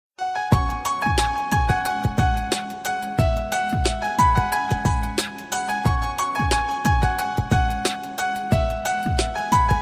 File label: music ringto; nuvvila